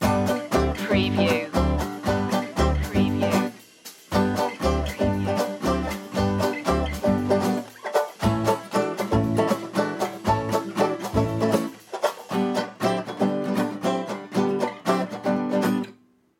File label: clown-o-rama loop